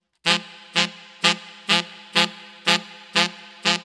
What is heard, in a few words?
125 Roots Reg Sax Section; Digidesign; Pro Tools; Pro Tools Essential Demos; Essential Loop Demos; Demo Session - World